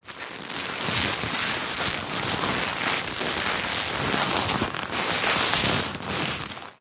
foley luggage leather handbag handling rough squeeze creak